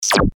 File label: syntetic zap